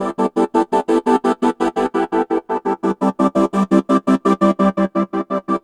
Gated Strings

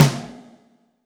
Snare, Alesis DM5

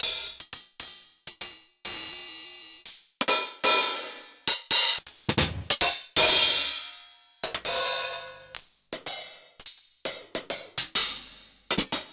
Akai XR-20 Hats

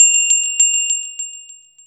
HI BELLS; Back In Time Records; Big Bang

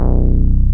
MINIMOOG; OLD SYNTHS; Rubber Chicken Software Sample Bank

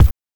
BANK1 BD, NES DPCM; MIDINES

